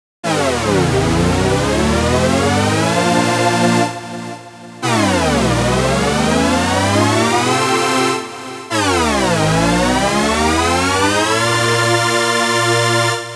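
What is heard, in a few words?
sequence saturn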